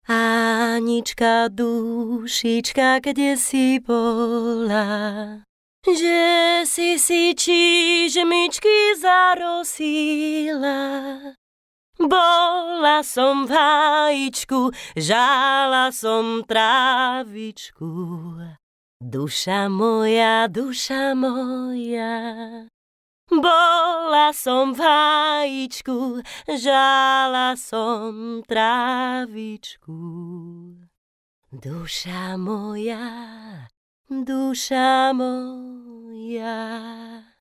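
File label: Female Slovak sing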